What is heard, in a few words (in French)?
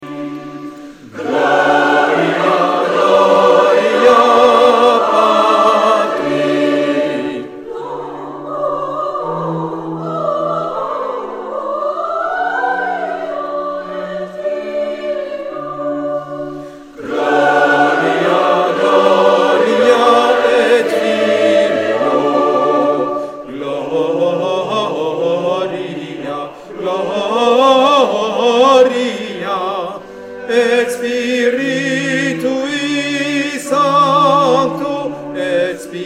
basses